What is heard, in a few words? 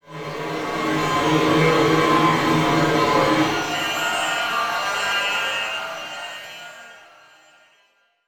Title Transition Evolver Long; Production Element